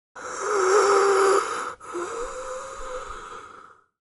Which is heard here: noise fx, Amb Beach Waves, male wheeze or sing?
male wheeze